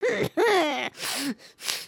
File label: cry